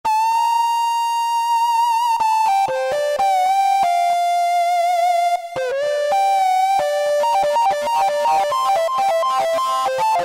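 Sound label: Guitar Warm, Yamaha TG500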